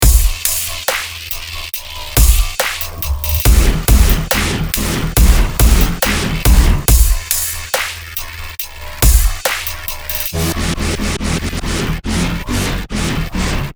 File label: brass; ddnet